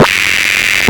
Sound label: Static 180, Hard & Loud Techno; USB Soundscan vol.01; AKAI